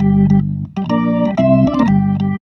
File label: B3 LICK G, PLAYED